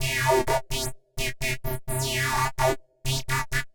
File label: 128 C, uk garage, Synths; Targa; 128bpm Lines n Loops